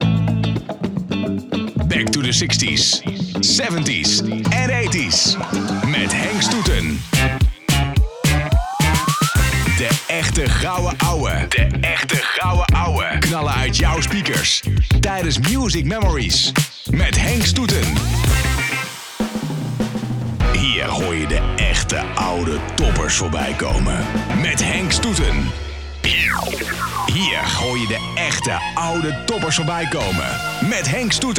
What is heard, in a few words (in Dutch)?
Radiovormgeving Music Memories Oldies